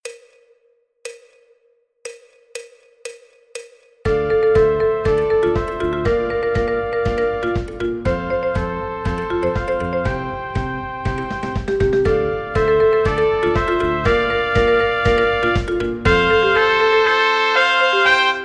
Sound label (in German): Melodieinstrumente Str schnell